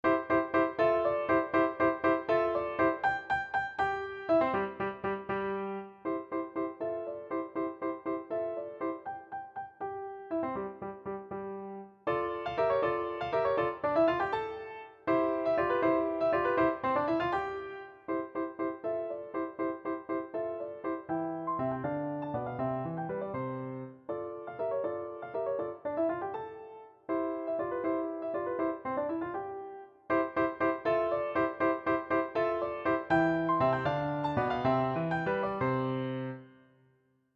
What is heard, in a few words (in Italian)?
Rondo per fortepiano Un poco Andante; Koehler